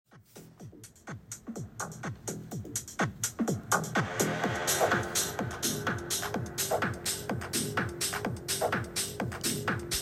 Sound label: tunztunz music